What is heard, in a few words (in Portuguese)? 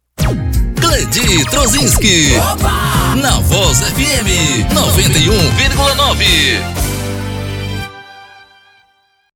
vinheta